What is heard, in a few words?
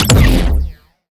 Shot Medium Laser Photon Pulsar